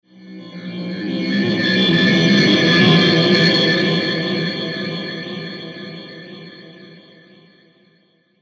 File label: Heavy Space Metal Transition; Transition Sound Effects; science fiction sound effect